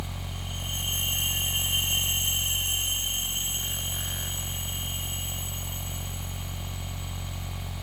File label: Capsule Cleansed Loop; Warframe; Missions; Zariman; Zariman Survival